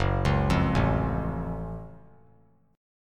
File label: F sharp Minor Major 7th; arpeggiated